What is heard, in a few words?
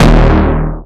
Root D#, Bass